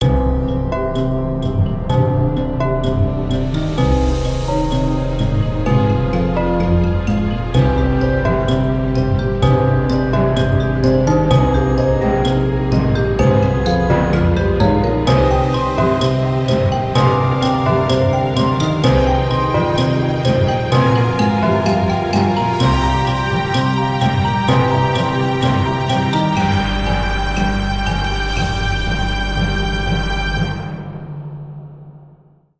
Desert Ominous; Slime Rancher Plortable Edition

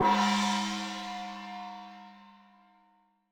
Gong Hit Small; unruly heroes

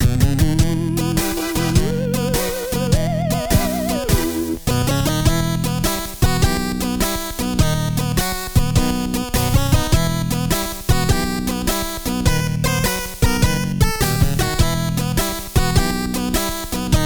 music farm loop; Skellboy

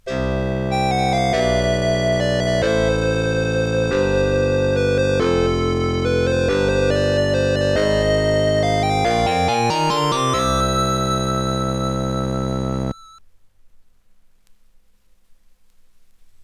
All Clear; golden axe i ii

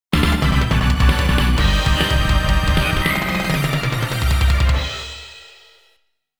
Stage Starting; super muscle bomber

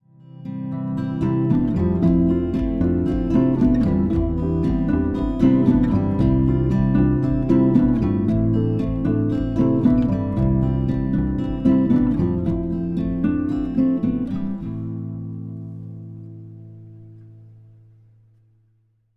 music; CampusA

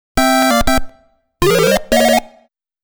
Item Obtained, Pause

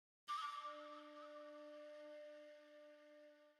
Flute; Frozen Flame

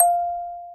Vibraphone F

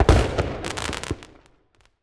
firework; teardown; ps5 windows xbox series xs

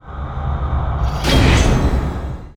locket open; Sea of Lies: Mutiny of the Heart Collector's Edition

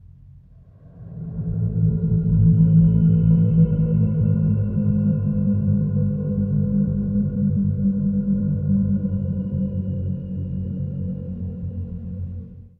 stinger; Joe's Diner

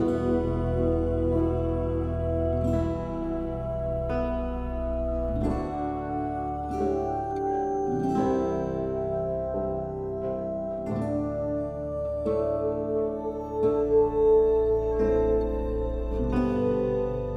Loop Hopeful; the walking dead michonne

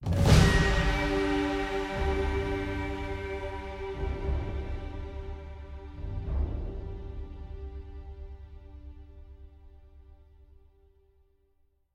String; assassin s creed unity

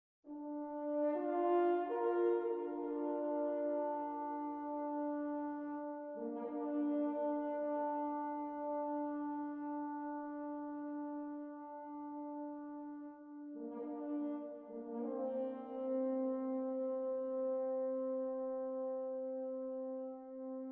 Horn 58bpm 4-4

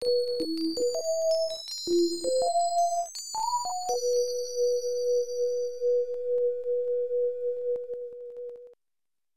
QSound Logo; Capcom Sports Club

